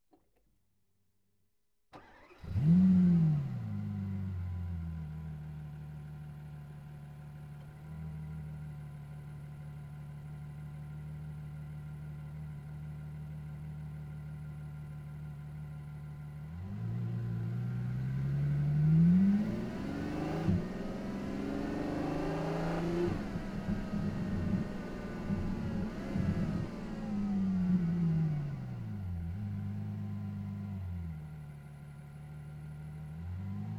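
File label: Ferrari F12 Onboard Start Medium Drive Stop Reverse Stop Drive Stop Off Engine Left; 2016; DPA4061; Pole Position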